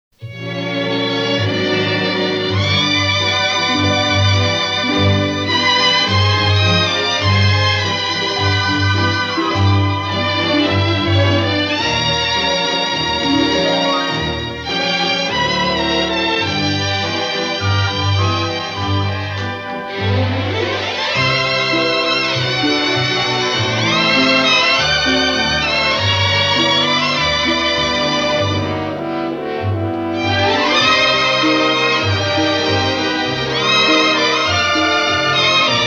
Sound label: instrumental